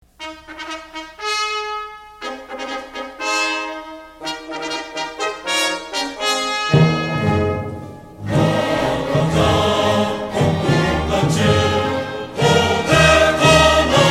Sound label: cheer music; university